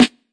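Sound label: classic hip hop snare; Classic Hip Hop Mega Kit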